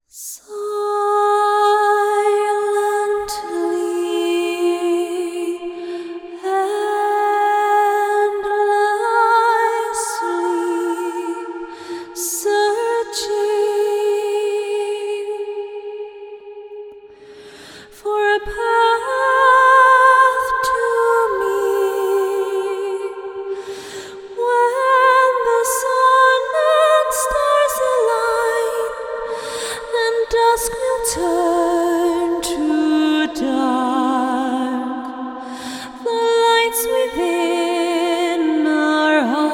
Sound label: Top Line Vocals - Fm 80Bpm (WET); Construction Kits; Ghosthack; SkyRealm; Kit 08 - Into The Light